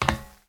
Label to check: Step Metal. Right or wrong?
Right